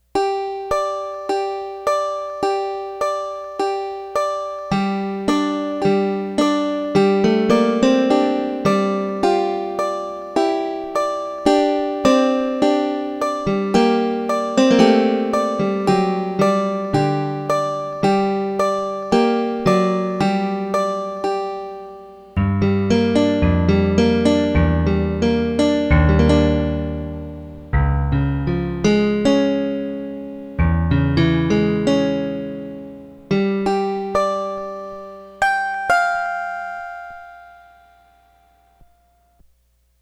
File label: HAMMER DULCIMER